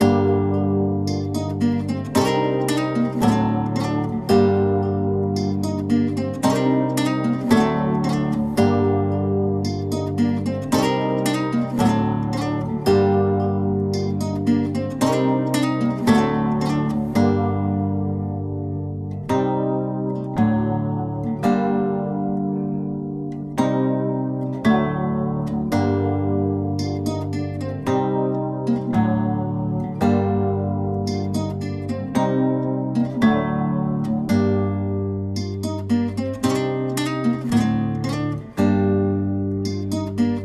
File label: 112 BPM G Min, Guitar Loops; Lo Siento; Cymatics; Journey Guitar Loops